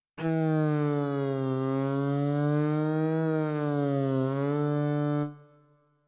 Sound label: portamento